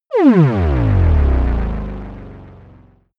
break down

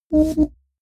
notification synth wobble once, signale

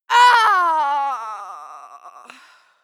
female fight cry die; schreie; menschen

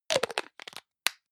hygiene small screw cup close hard; haus-garten; badezimmer

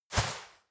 step walk textile light; schritte

